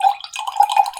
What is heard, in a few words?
Dribble, Water Dribble